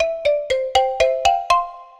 ringtone; soundscrate

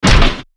Robotic Footstep; SoundsCrate

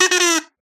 kazoo short fall; soundscrate

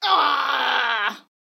woman pained groan

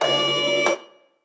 robotic arm; soundscrate

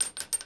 Gun Shell Drop; soundscrate

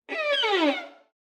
Violin Slide Down

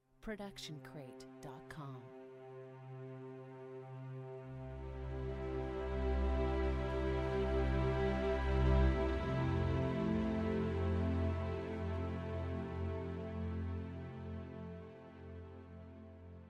contemplative strings; soundscrate

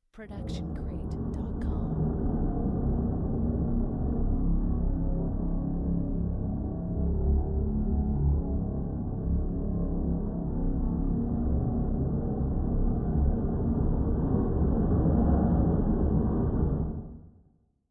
Scary Rumble; soundscrate

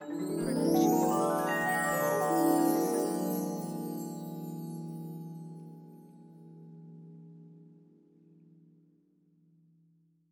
fantasy gliss; soundscrate

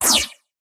Anime Punch Synth Swirl

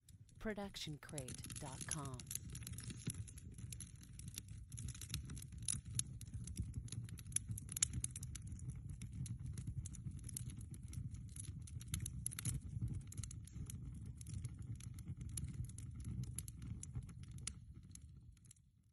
Outdoor Fire; SoundsCrate

